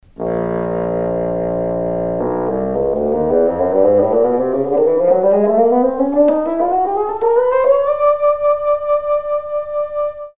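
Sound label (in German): fagott töne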